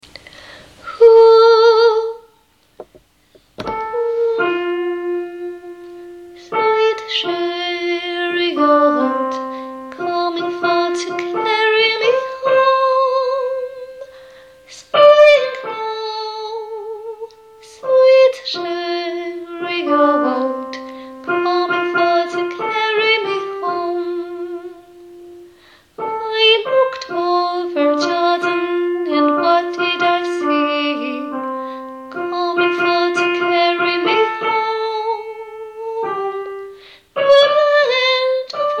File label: soprano